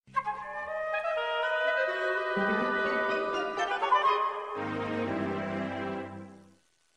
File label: Chopper - Break